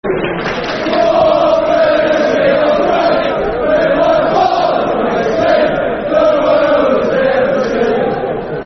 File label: Football Chant (Soccer Song); And It's Watford F.C.; Watford Wfc